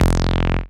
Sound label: F Acid